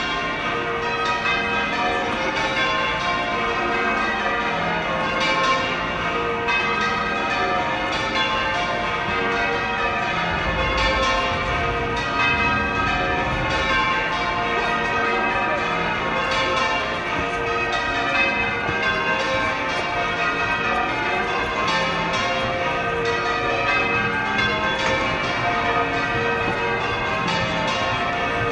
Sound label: occupy london with bells